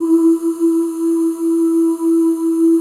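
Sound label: E3 FEMALE OOS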